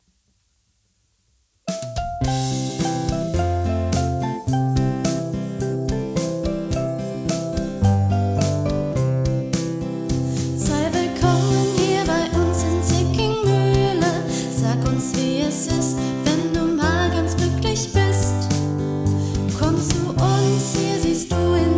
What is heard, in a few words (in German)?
Schulhymne mit Gesang; Sickingmuehle